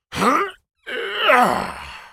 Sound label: spell cast effort; Darius; Character Voices - English